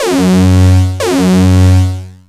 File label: boss entrance